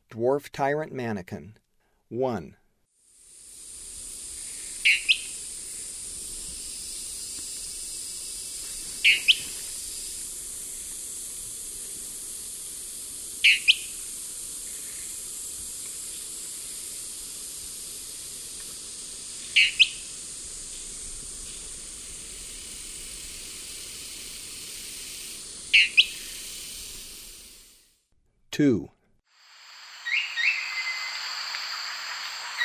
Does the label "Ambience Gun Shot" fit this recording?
No